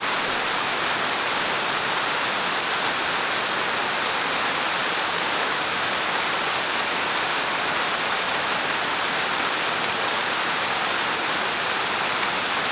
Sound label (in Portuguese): Parque terra viva de costas para a cachoeira; agua